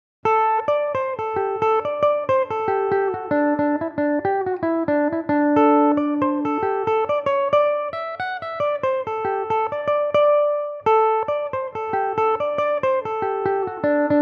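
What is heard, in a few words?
D mixolydian, Irish mandolin; Belmuse EM-190 electric mandolin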